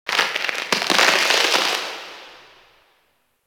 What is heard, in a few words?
Ice Close Ice Break Small; Warframe; Corpus Ice Planet